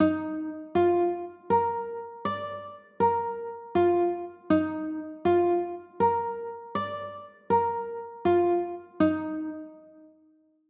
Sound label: d sharp maj9, chords